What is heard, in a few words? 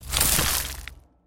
crushed stone drop